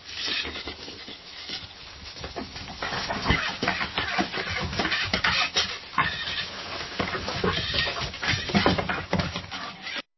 scurrying rats

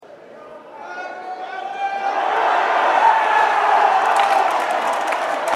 CHEERING FOOTBALL GAME; CHEERING AT A GAME

